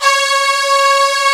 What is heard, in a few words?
C#3, VIOLIN SECT; AKAI S6000 CD-ROM - Volume 1; Strings